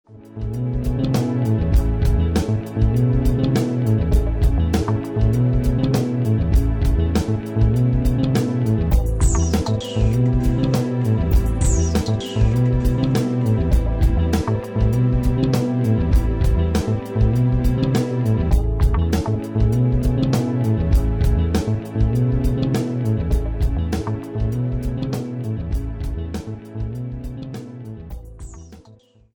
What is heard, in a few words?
smooth breeze